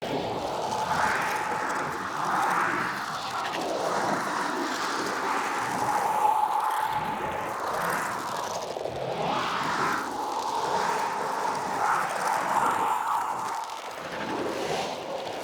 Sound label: Staff Caster Block Loop; Weapons; Player; Staves; Wazzard Staff Caster